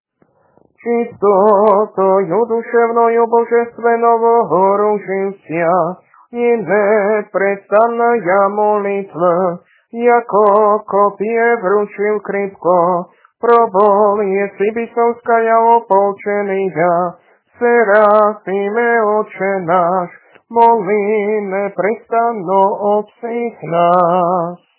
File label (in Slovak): Kondák hlas; Kondák prepodobnému, hlas 2; nedelna-skola